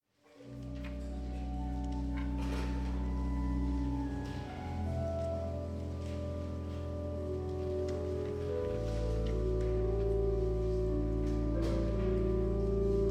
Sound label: improvisation